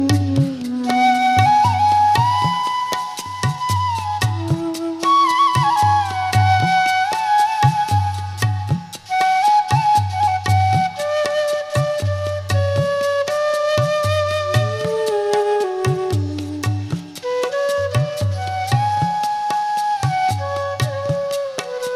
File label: Old Song Flute